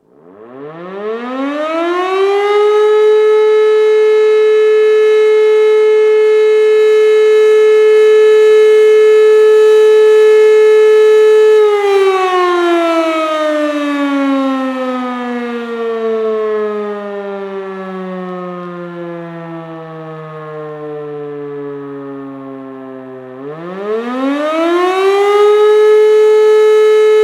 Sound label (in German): Sirene